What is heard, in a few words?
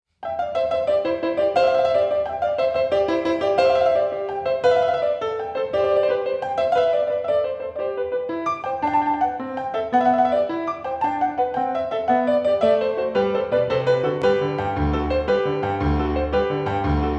Sonata in C major